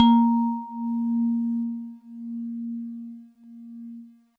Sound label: GVibes DX100 As3; good vibes